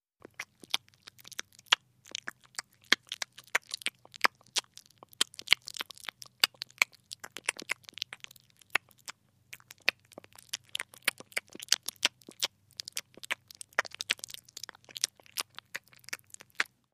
Cat Eating Wet Food

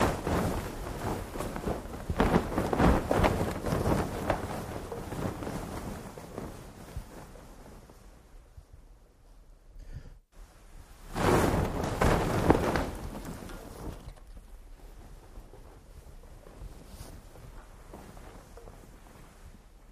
Flag Flap Less Movem; flags

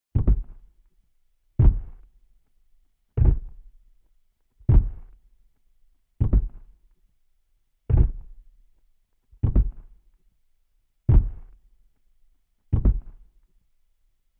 Footsteps Giant, monster

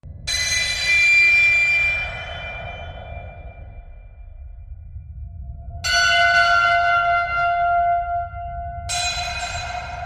Anviled Machine High, impacts

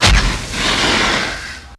Flash Powder Poof Si; flamethrower

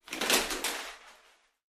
shower curtain; curtains

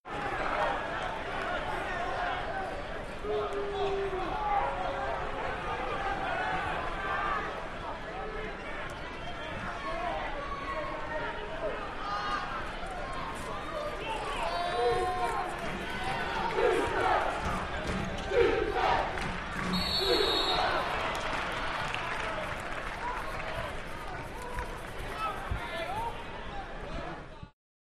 Basketball Game Cro, sports arena

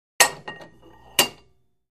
Free Weights Exerci

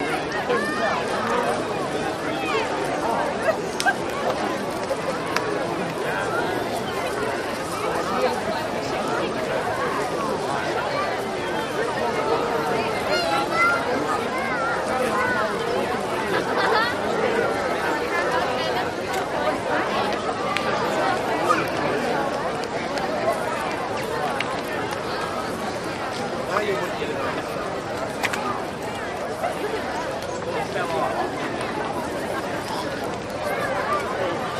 Crowd Background Talking And Walking; Crowds EXT

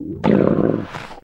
Camel Gurgle Snarl; camels

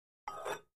Free Weights Exerci

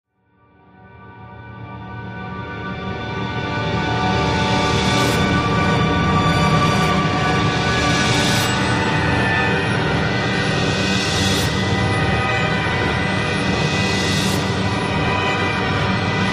Music Eerie Orchest; orchestral

